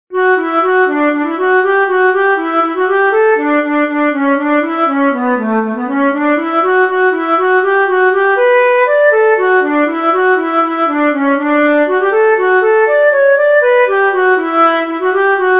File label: farmers jig